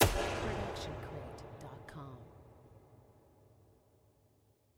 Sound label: Gun Shot Reverb; SoundsCrate